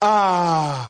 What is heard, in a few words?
ah relief; Voice; ooh ahh